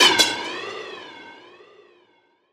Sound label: snare space